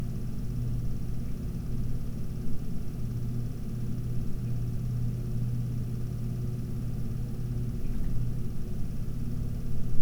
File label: 1000 RPM